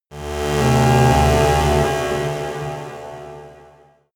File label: sci-fi cosmic high energy; horror